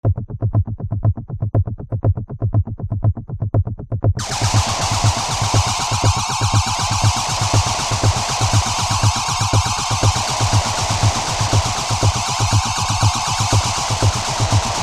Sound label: noise work; kom-intern-funkspiel; stakhanovism